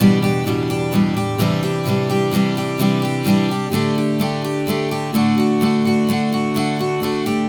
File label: Acoustic Latin Guitar 128BPM Emin; Acoustic Latin Guitars; Vengeance; Essential Clubsounds Vol.4; Guitarloops